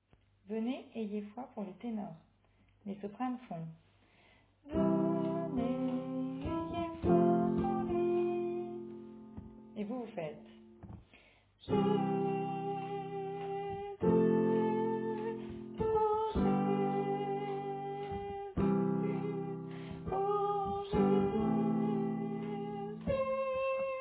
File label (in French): Tenor